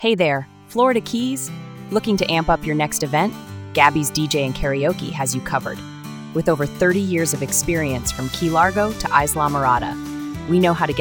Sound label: Rock the Keys, Karaoke; Beach Life Radio Station